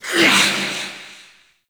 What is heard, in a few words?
Reaper Vocal Melee; Warframe; Wraith